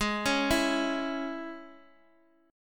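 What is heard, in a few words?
Ab Suspended 4th Sharp 5th chord, Guitar, Drop D tuning; x,x,x,1,2,0; down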